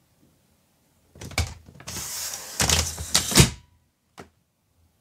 luggage; باز کردن چمدان

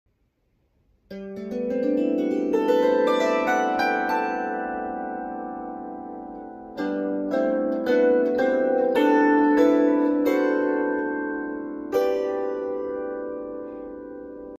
Lyre

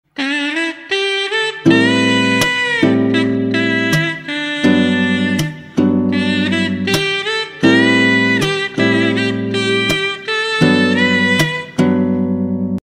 kazoo

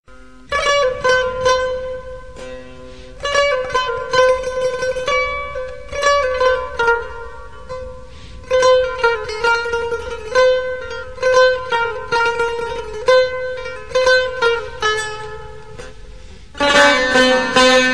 traditional ringtone

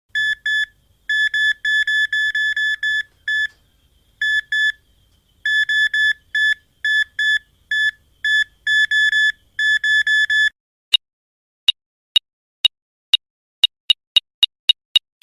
metal detector; فلزیاب

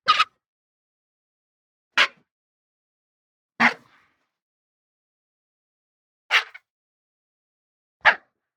Cape-vulture, پرنده دال دماغه; صدای پرنده دال دماغه

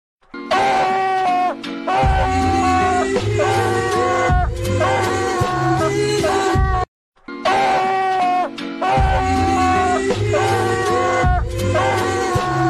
nooooo meme; میم طنز نههههههههههه

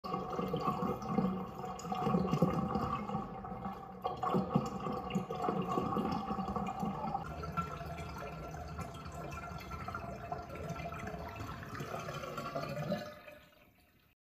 bathtub

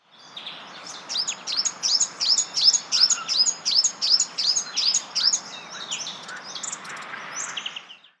spotted scrubwren

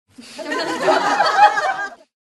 old women laughing

